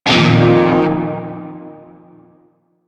Shawzin Pent Min Chord C; Music; Warframe; Narmer Shawzin

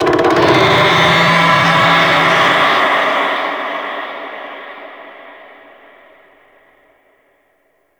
Infested Golem Vocal Attack; Warframe

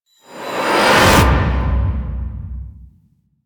Pause In, UI; Warframe

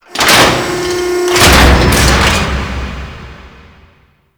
Shipyards Foundry Pot Fall; Ambience; Props; Warframe; Grineer Shipyards

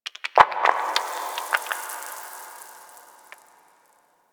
Moon Dust Fall Small, Ambience; Warframe; Orokin

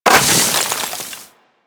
Melee Impact Snow; Warframe; Weapons

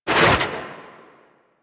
Operator Focus Dash Wave; Warframe; Operators; Powers; Tactic

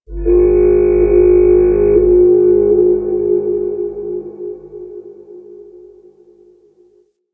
Ocean Fog Horn; Warframe; Grineer Ocean

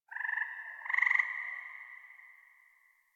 Forest Distant Frog Call Resp; Ambience; Warframe; Grineer Forest

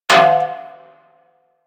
Bullet Impact Debris Pipe

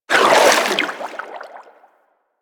melee whoosh; Warframe; Vehicles; Sharkwing

